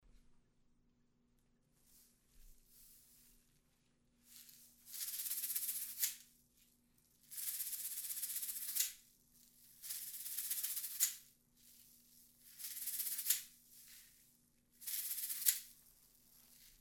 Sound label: basket shaker